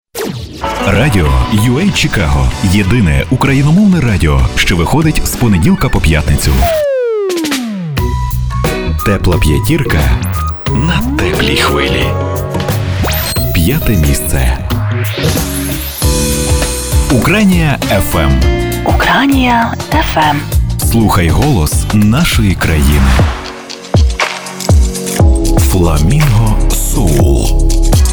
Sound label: Radio Jingles